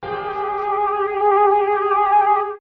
wobbly

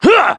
"huh"

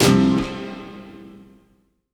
bouncer, Notes, Guitar

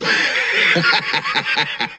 laugh wheezy; Voice; ooh ahh

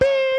bee cartoon; ooh ahh

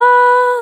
haa, Voice

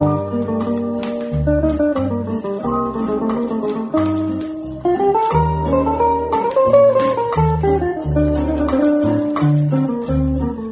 guitar spanish, Loops

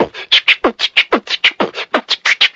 Beatbox, Drum loops

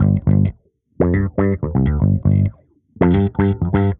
bass, Loops, Phonky

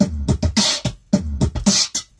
xrays, Beatbox, Drum loops

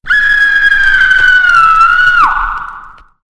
scream

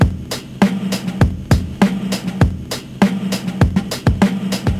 brisk pace, Boombap, Drum loops

